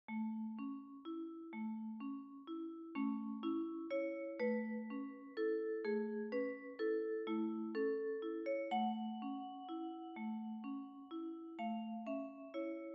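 lullaby, solo music and ensemble music for viola and other instruments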